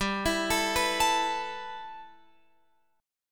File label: G 6th Add 9th, Guitar, Open F; G6add9 arpeggiated; x,14,16,16,14,16